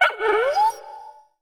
Wink Fox Attack

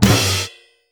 Magician Death Underlay

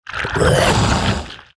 Piranha Swarmer Attack Sound

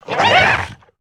Death

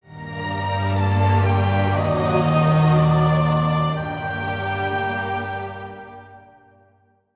Play Stinger; Moonfang

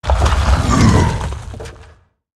Superior Golem Attack

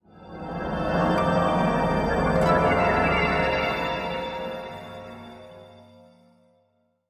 Play Stinger; Lucentbark

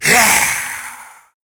Skeleton Attack

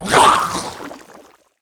Corrupted Seer Death

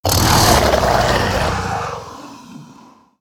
Pit Crocolisk Death

